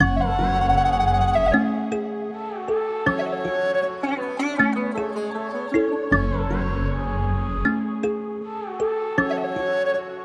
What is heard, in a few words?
157 BPM F# Min, Melodies